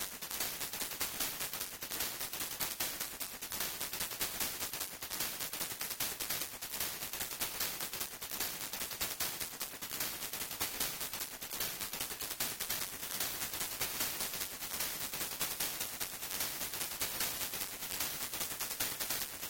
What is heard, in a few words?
High Score Table; Combat Cars; Genesis